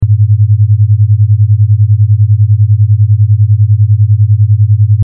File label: binaural 100-110hz